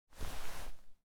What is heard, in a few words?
Holster, Super Shorty; zps; Weapons; Firearms